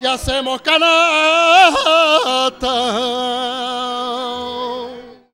VOC, Gipsy

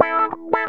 GTR, Funky; Zero-G; Funky Elements

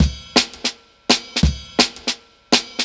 168, Jungle Breaks; Transform; Original Jungle Breaks

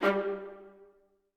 G2, Orchestra; AKAI Advance Orchestra Upgrade 97 Vol.1

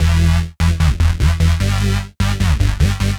Roughas 150 E, future rave samples, 150bpm

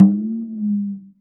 GLISSENDO; Univers Sons - Raricussions

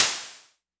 Crashes; LIMAT Div Dept; Drums

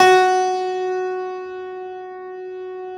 F2, Classical Piano ff +ped; PS-53 Total Piano; Brilliand Classical Piano

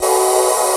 SYNMALE; Best Service Dream Experience

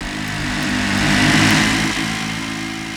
Kaos FX, Ambient Grooves

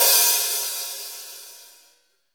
CYM 14, Real HiHats, 14 Hi Hat

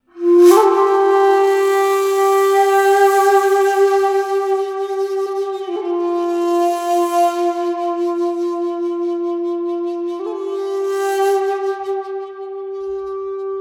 Shaku, Wind Instruments; Sonic Foundry (Sony Creative Software); World Pop

